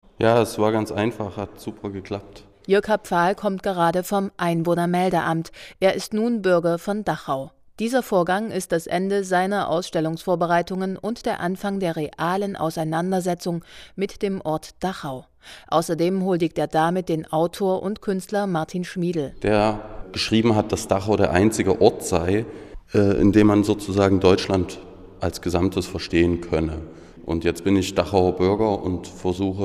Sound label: salsa